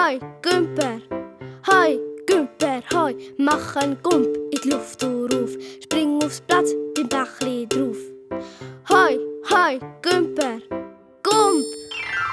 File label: rap